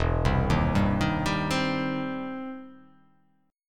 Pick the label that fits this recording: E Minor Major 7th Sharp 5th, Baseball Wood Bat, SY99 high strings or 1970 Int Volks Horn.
E Minor Major 7th Sharp 5th